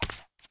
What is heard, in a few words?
shock step; stepsounds